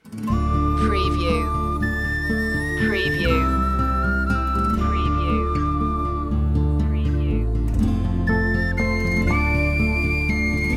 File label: high flute version